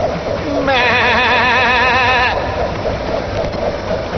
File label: Mmaaah Msg